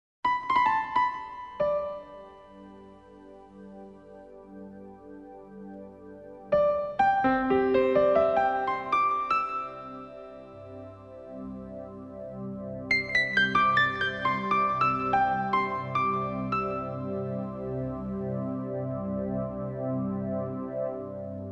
Easy Listening & Classical; Sound Ideas

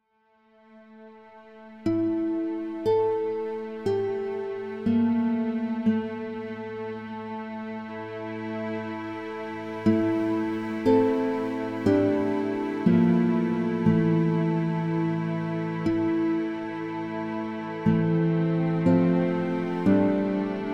Intro, long ago, guitar pluck, strings

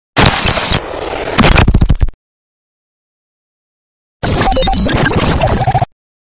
Galery Noise; Sound Ideas - Galery Noise 001

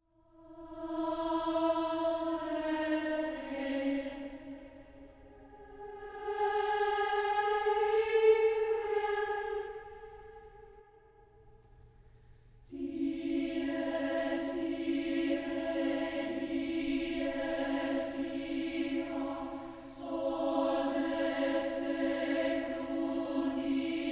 Choir Only

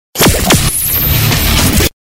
Galery Noise; Sound Ideas - Galery Noise 002

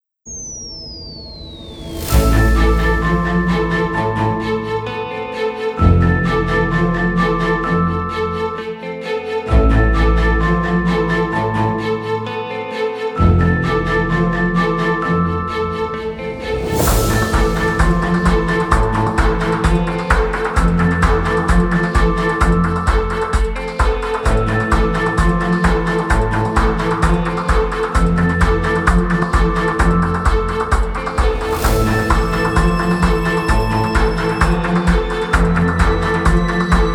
Ballot Box, NEWS BEDS

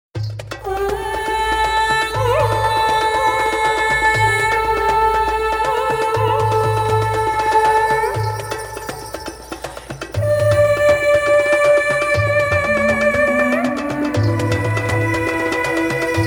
Drums & Choir Only

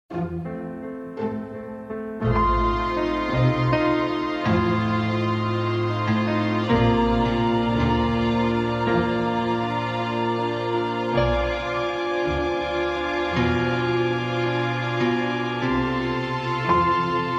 Easy Listening & Classical; Sound Ideas

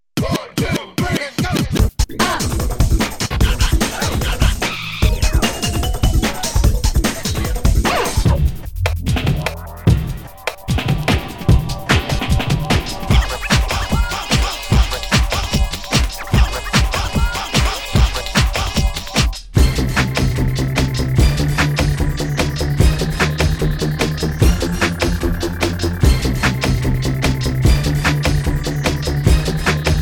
bed high speed n crazy

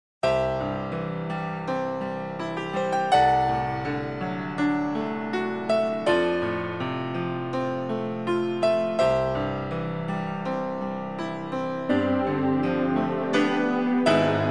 Easy Listening & Classical; Sound Ideas

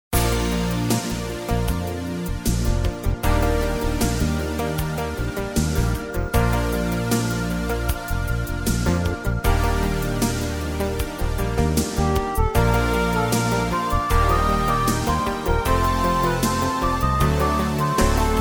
Easy Listening & Classical; Sound Ideas

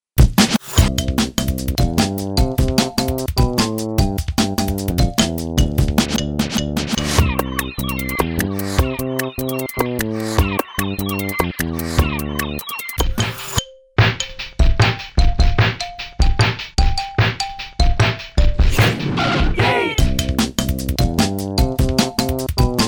bed caribbean

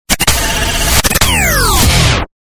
Galery Noise; Sound Ideas - Galery Noise 002

